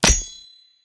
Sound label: ranged dagger